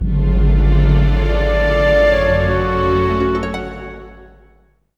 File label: Closing (Stinger); medievil game rip